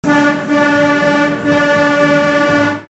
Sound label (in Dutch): Disco Train Hoorn, Kermis